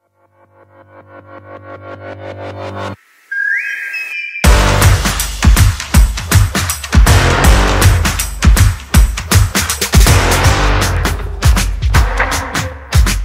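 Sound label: Accompaniment Parlando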